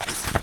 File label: page